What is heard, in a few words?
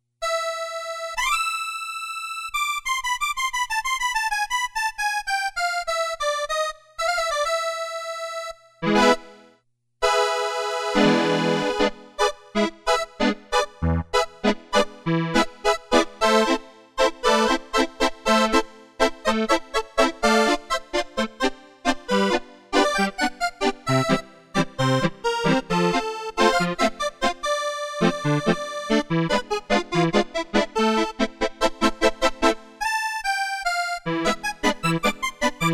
Accordion, Casio CSM-1; Tones